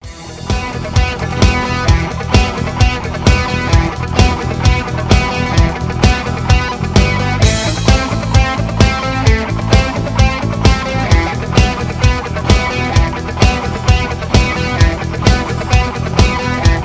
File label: instrumental